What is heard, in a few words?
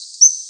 song